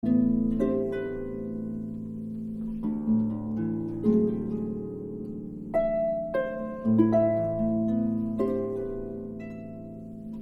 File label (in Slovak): harfa